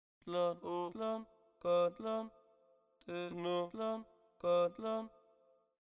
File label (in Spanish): las voces; mesoamerica